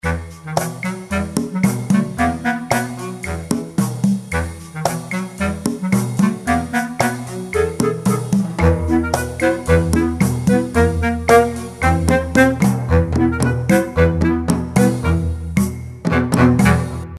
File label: Story Music